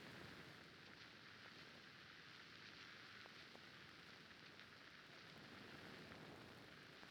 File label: noise